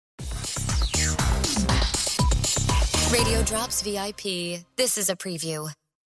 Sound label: Music Beds